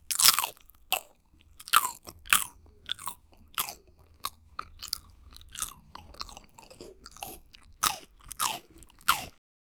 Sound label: close up asmr sound of a, mouth